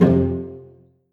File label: Number sound